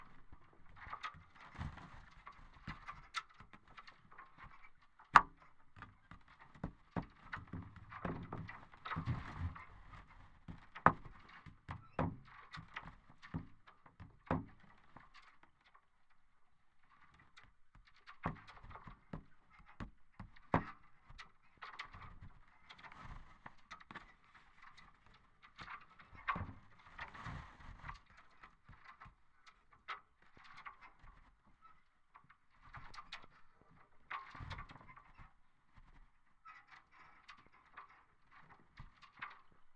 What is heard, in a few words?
Sailing